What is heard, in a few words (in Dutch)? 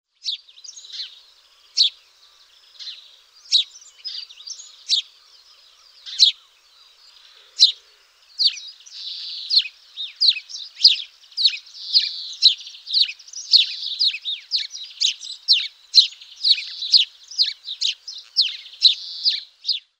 Huismus